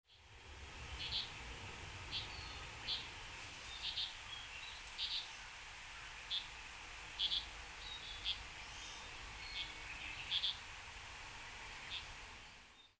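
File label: Japanese leaf warbler, hyogo; mebosomushikui; koe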